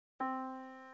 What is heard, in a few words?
yamaha Disk